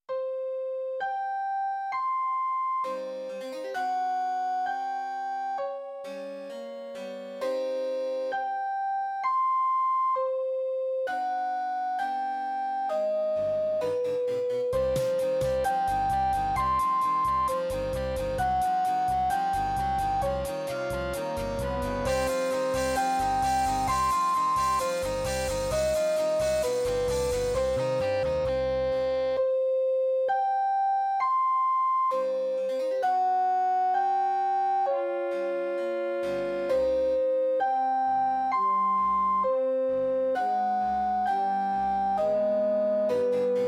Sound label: stereo typical sci fi theme